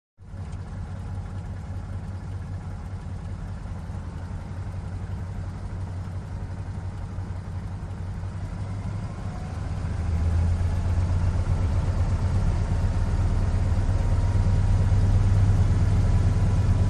Interior; Slow, Smooth Idle, Throttle Up With Prop Growl, Rev To Takeoff And Fly At Steady Rate. Close Perspective. Prop Plane.; Broussard M.H. 1522